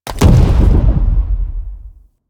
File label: Obelisk Reset; Warframe; Infested Eris